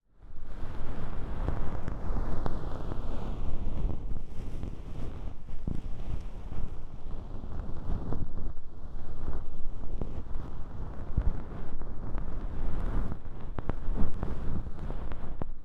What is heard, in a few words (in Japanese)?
梵天で耳かきをする音, 声なし就寝用音源; 耳かき音玉てんこ盛り！！03～声なし就寝用音源～